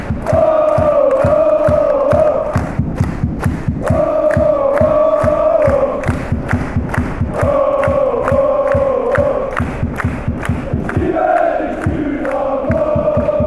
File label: chant, fan chants; dresden